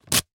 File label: flip